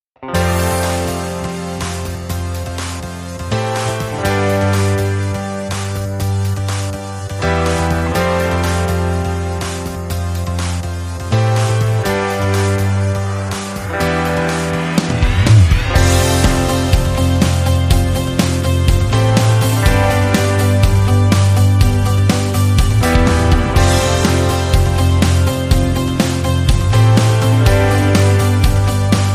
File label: No Vocals and Piano Theme